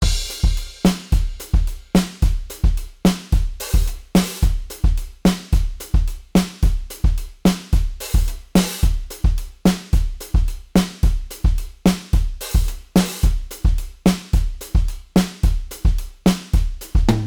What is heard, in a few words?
modal surf, drums